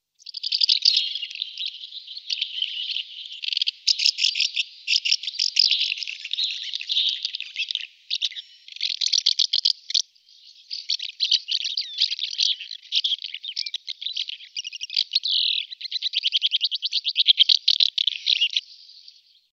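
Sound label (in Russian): ласточки в лесу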